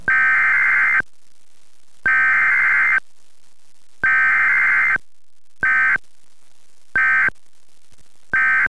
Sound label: eas test